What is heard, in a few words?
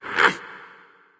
Roar Attack